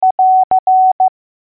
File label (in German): Ende, morse alphabet